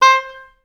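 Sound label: oboes staccato C4, orchestra; Best Service; Peter Siedlaczek's Advanced Orchestra; OBS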